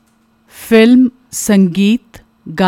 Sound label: film sangeet, film music